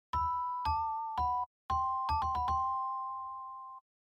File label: Metal Riff